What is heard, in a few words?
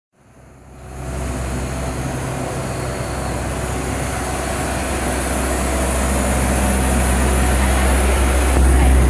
streetcleaner